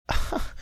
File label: cute chuckle